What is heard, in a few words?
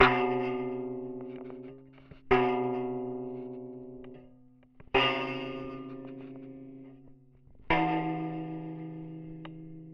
contact perc